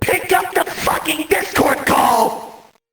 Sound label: discord call; jevil